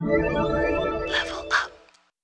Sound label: levelup; tetrafish